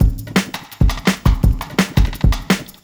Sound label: 168, Drum Loops; GetThisVibe; M8; Electronisounds